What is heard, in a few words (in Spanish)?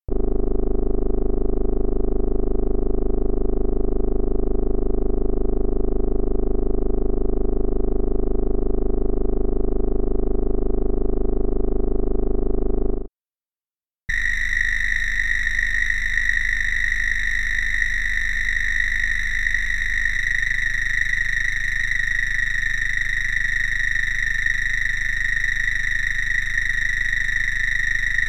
musica de terror